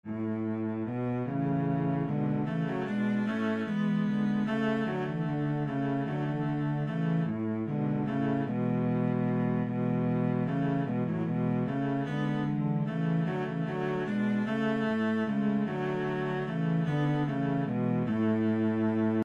minuet, cello

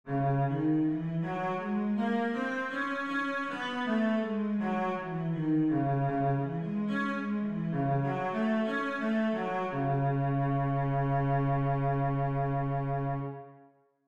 c sharp minor, scales, cello

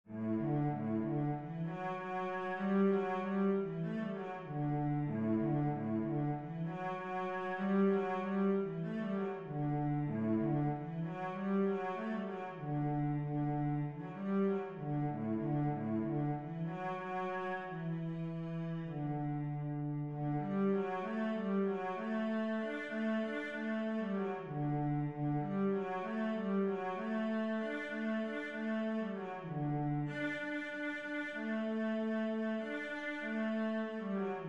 sara cello

